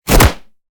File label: left hook; battle